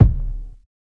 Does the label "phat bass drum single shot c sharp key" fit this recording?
Yes